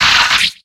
Grito de Surskit

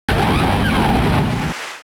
Grito de Torterra

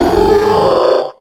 Grito de Lunatone

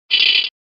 Grito

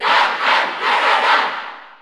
Grito del público (Español NTSC); Duck Hunt; SSBU

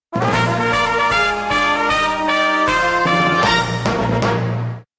Tema de victoria; Kid Icarus; SSBB

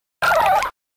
Grito de Shellos

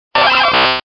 Grito de Kabutops

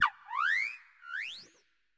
Grito de Palafin

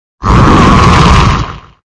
Grito de Carkol

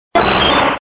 Grito de Omastar

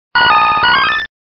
Grito de Victreebel

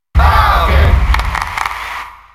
Grito del público; Captain Falcon; SSBM

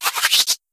Grito de Wimpod

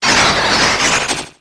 Grito de Falinks

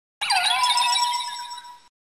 Grito; Uxie

